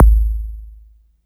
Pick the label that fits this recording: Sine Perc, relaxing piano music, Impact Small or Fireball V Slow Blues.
Sine Perc